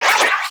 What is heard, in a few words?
Ring Charge Pre Cast; Universe Ring; Warframe; Nezha